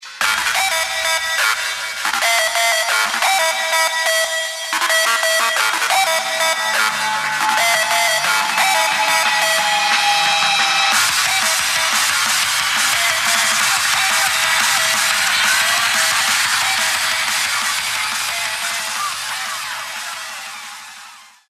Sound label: ringtones; gmod; perp2.5